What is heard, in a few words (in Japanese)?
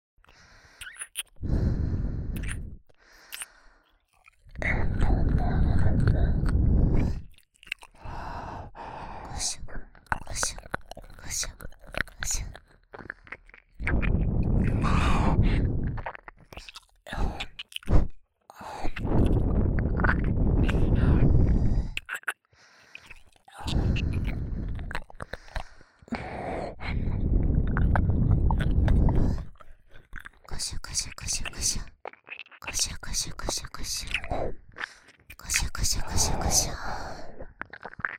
（ループ用）左右同時くすぐり